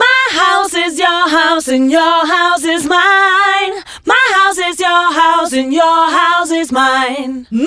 женский вокал

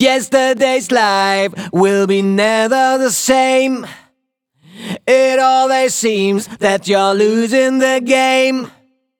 звук вокала рок поп

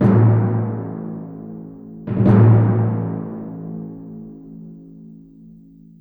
Timpani, музыка для фильмов

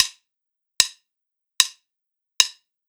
сэмплы ударных регги; Counter

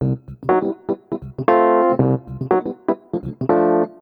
Funky, джазовый сэмпл keys jazz sample

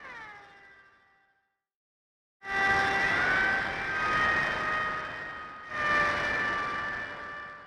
X noise, минимал

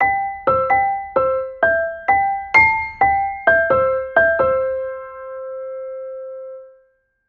Drops, латино

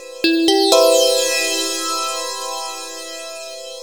сэмплы пэдов диско хаус

